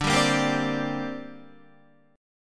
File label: D 7th Suspended 4th Sharp 5th, down, Guitar; D7sus4#5 strummed